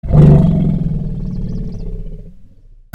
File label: Greeting Elefant